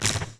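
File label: Force Field Spark; naboo; Star Wars Obi-Wan; XBOX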